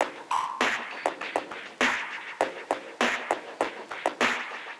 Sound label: Ghost Loop 100BPM, drum loop